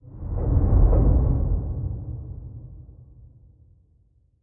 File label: submarines engines; World Of Warships